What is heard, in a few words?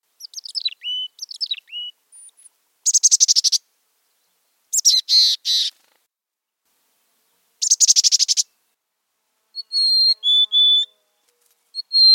Mountain Chickadee